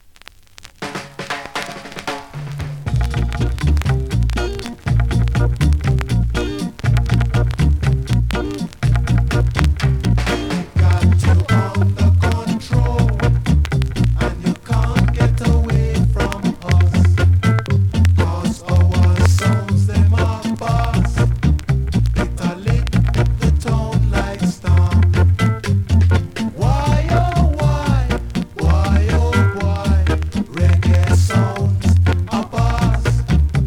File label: REGGAE SOUNDS